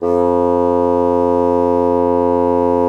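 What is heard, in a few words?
bassoon forte F2; Xsample VOL-6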